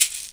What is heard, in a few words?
gourd; Greytsounds; EXOTIC PERC